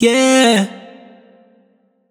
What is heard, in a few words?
riff Airy Run Gm wet, Vocal; Slate Digital Omnivox Vocal Sample Pack